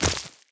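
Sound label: footstep spider giant; animal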